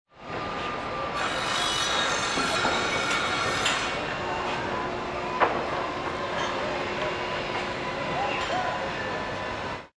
construction; Machinery; Construction 1

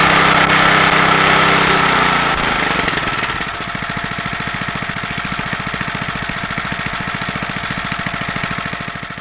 lawnmower

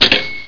cash register

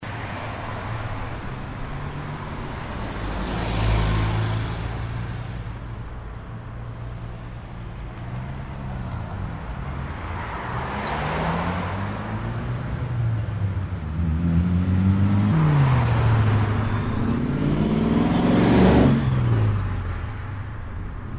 Town Traffic

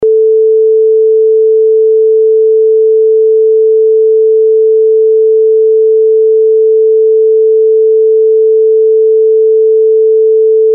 440Hz; tone; 44100Hz; 16bit

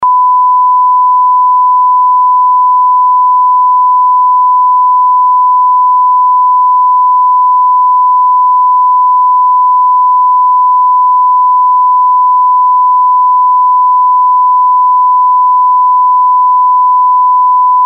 1kHz; tone; 44100Hz; 16bit

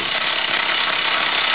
jackhammer; Machinery Sound Effects; Demolition

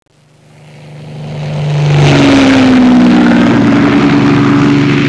mustang merlin, planes

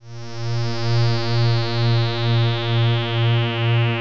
sweep, alien

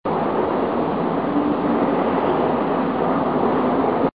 wind

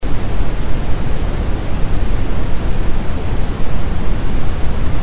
brown noise

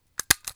staple, office

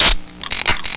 can open

